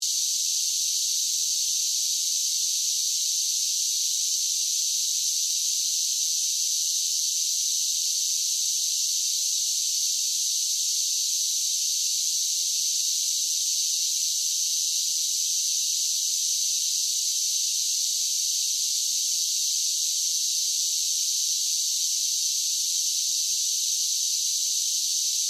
Cicadas Sing, Animal, Cicadas, Sing, Song, Buzz, Insect, Bug; Cockroaches; BlastWave